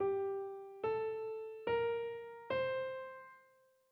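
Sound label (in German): Tetrachord Dor g auf, dorisch; Tetrachorde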